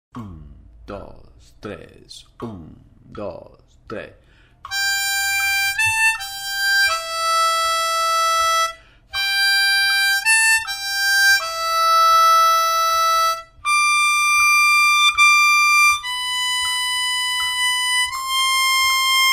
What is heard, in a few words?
Silent Night, armonica